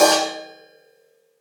dark open high hat sound d key, open high-hat tuned to the D note